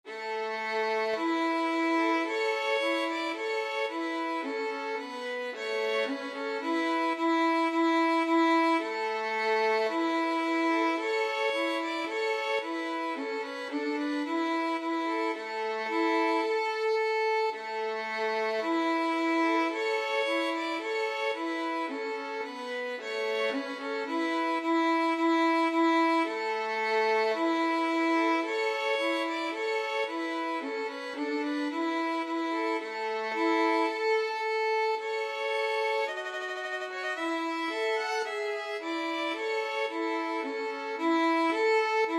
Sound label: trumpet voluntary, violin duet